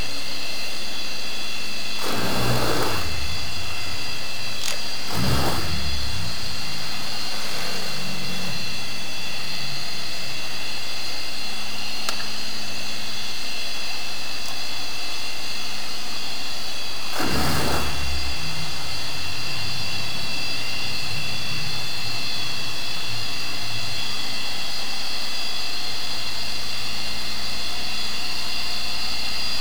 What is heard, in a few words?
Kite Outboard